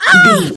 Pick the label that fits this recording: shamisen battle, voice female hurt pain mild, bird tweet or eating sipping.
voice female hurt pain mild